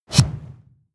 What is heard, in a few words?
push